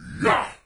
melee; doom3; commando

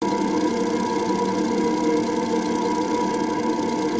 gunstand machinegun rotor loop, turret; gow; turret judgement

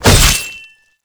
hit hardflesh slash; queen girl

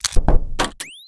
turret battery load

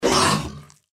deathclaw strike; npc

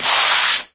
foot drag; doom3

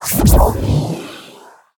charge cast

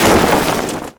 cloth med

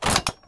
armor

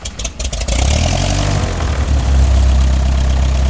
c172 startup; fsx

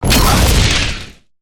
gunstand flamethrower fire burst, turret; gow; turret judgement

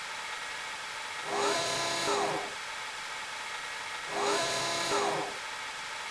idle, weapons; tfa cso; katyusha

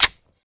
slide forward, weapons p220

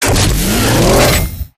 silverback get in

